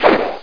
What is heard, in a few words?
bat miss